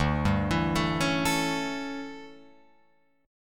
D Major 7th Suspended 2nd Suspended 4th, D, down, Guitar Open F; DM7sus2sus4; arpeggiated; JGuitar